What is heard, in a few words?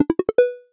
success